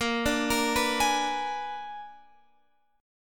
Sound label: Bb 7th Flat 9th Bb, Guitar Open F; Bb7b9 arpeggiated; x,17,14,17,14,15